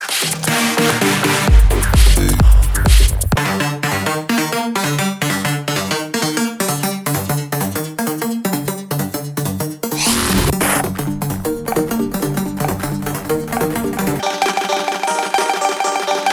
drop trance mix ringtone

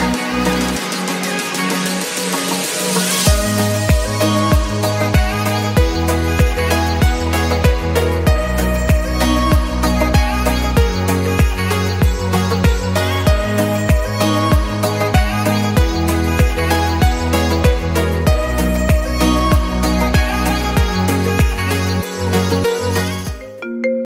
music ringtone

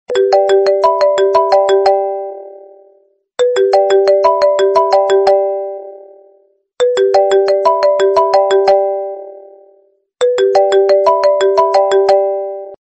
iphone ringtone

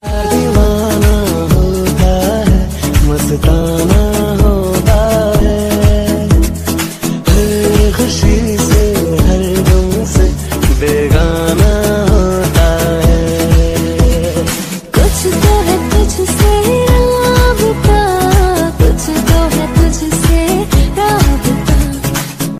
love bollywood ringtone

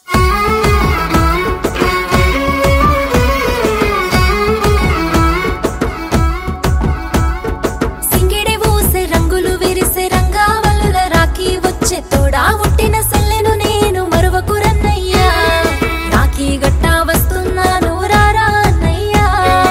remix telugu ringtone

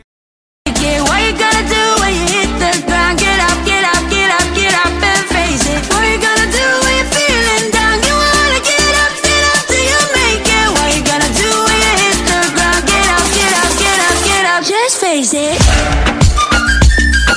english ringtones

